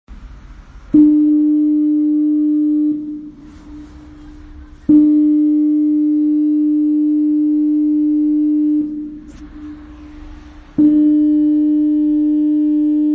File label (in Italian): nautofono